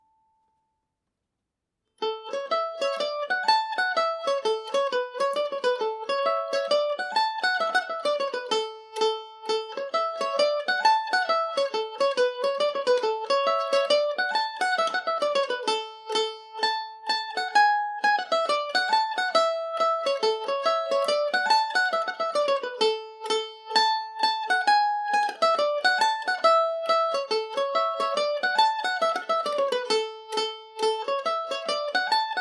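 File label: slide, A major, irish mandolin; mick duggans slide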